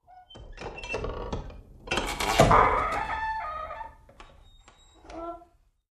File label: Creaky Doors open close